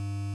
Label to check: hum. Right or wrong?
Right